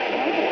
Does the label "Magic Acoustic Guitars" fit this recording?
No